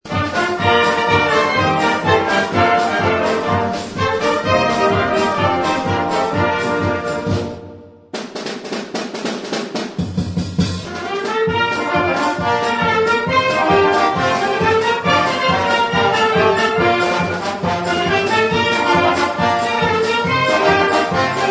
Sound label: jazz